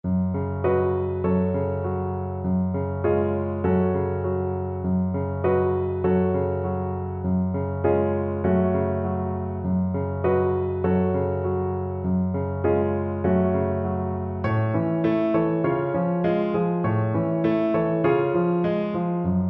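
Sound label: viola karaoke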